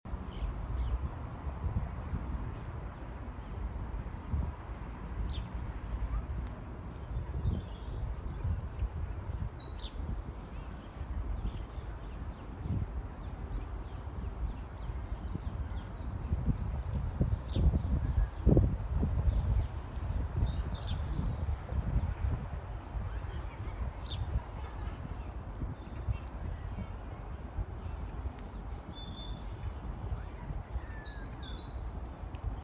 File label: Sparrow